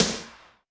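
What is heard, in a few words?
Snr Torqued, snare; Alesis D4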